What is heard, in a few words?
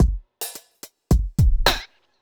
clapper 108